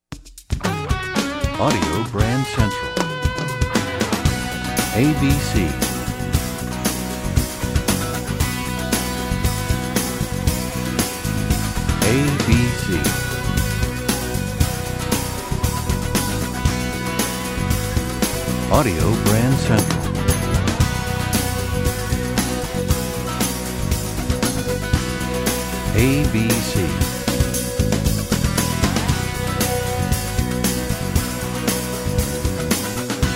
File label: Desert Wind, radio jingles